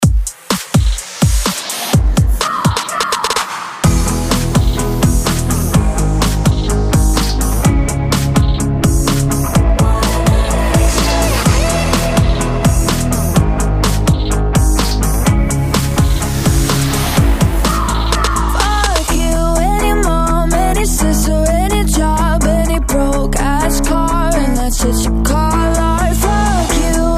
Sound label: breakbeat 126 bpm